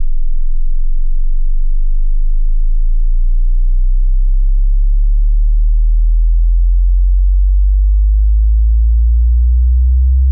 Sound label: bass sweep 20hz 200hz; speaker test